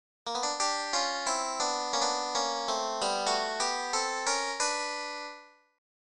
Lick, Blues lick

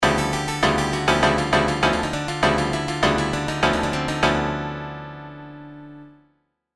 DEEP DRONE

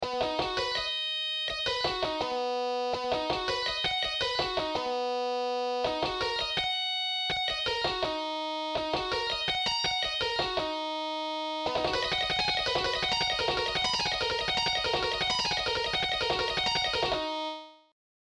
sweep Licks

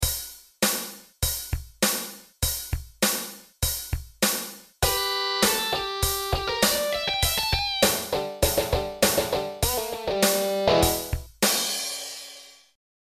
Blues, sample

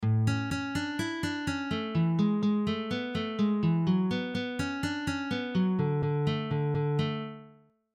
guitare intro bass

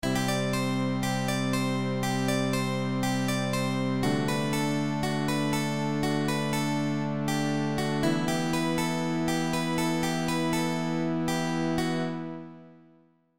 Blues

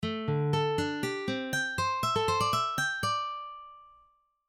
D minor Tapping Exercise; Tapping Licks

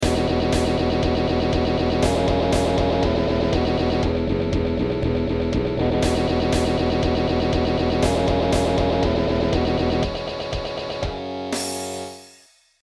Satanic metal

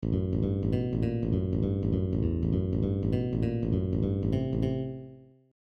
Bass Intro

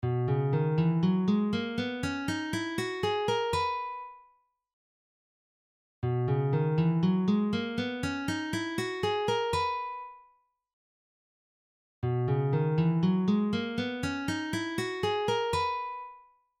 Scales in B; Scales and Arpeggios

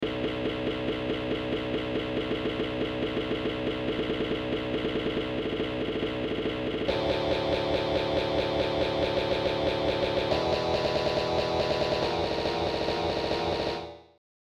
Trash & Grind Patterns

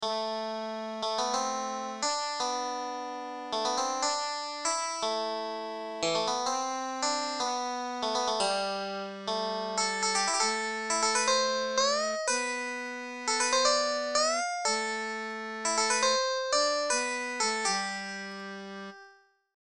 Solo

